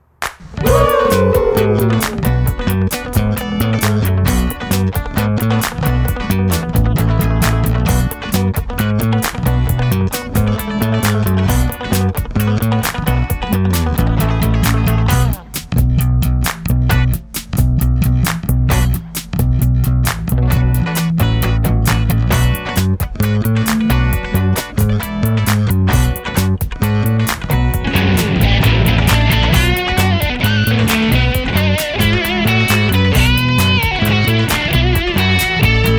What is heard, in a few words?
Coconut Funk Stems